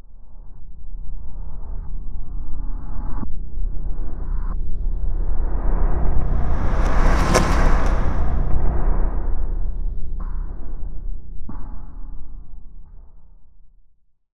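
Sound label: bash face reverse forward, Cinematic Metal Impacts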